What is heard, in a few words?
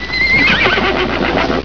neigh